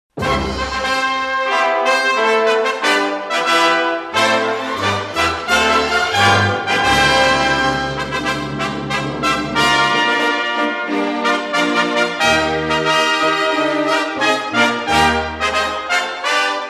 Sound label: Blaze of Brass; Ren And Stimpy Production Music Vol 1